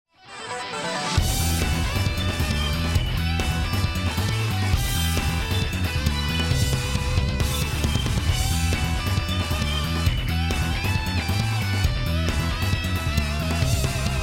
bomb planted; music kits custom

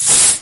hkp2000; weapons; xieshen

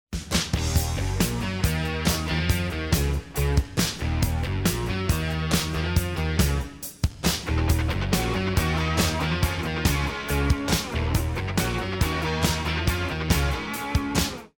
start action; music kits custom

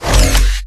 Translocator Deploy, weapons; Requests Studio; UT3

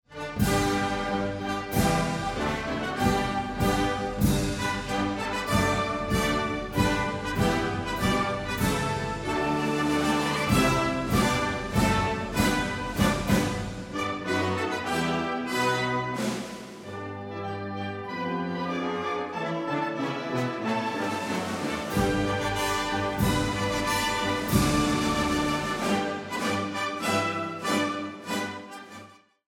bomb planted; music kits custom

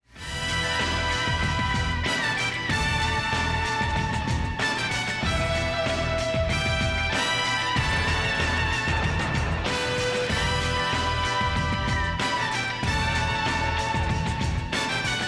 bomb planted; music kits custom

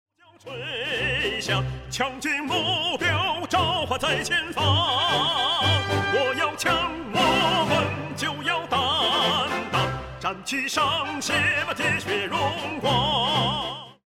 choose team; music kits custom

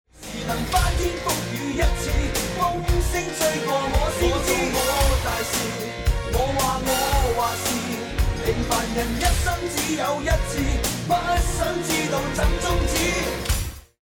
won round; music kits custom

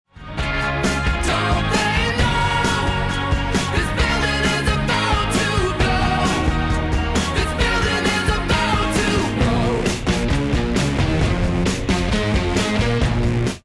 won round; music kits custom

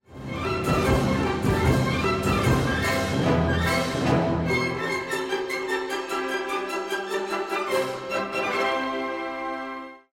bomb ten sec count; music kits custom

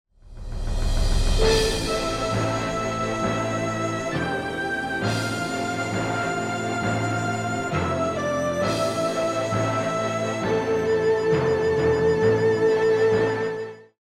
lost round; music kits custom

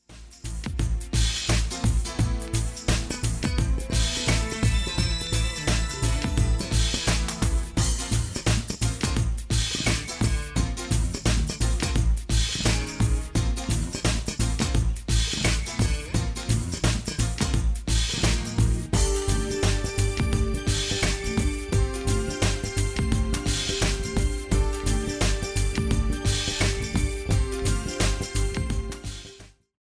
bomb planted; music kits custom

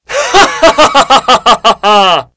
cheer

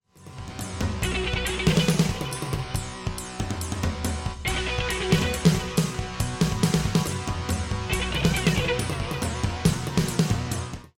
bomb ten sec count; music kits custom

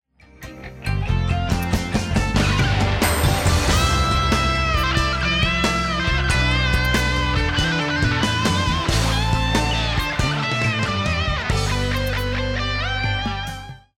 won round; music kits custom

